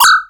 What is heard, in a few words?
Alert, Retro Games Sound FX